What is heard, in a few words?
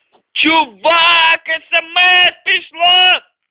SmS